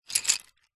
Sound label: office pencils handful pick up from concrete